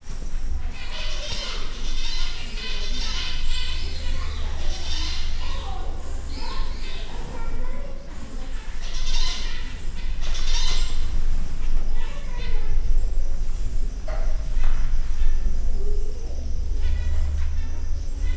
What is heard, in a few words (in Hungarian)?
egyszerre, léghuzatos felvétel, zebrapinty; Győri Zoo 2014